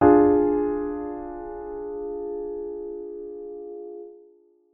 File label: diminished triad blocked d